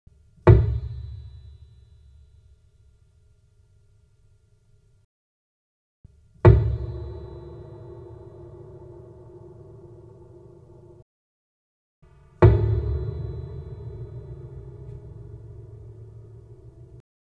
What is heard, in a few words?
Key, piano pedal